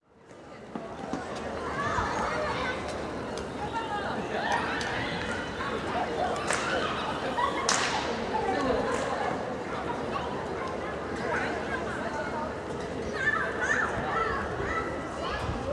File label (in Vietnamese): Tieng tre em choi trong cong vien cong cong